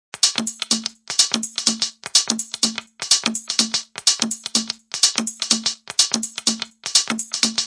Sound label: 125 bpm hats and percussion swung